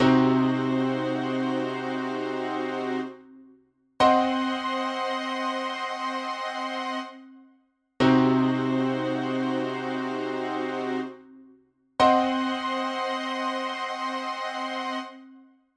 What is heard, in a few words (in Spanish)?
acorde triada disminuido